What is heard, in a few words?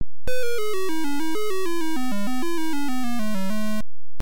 Game Over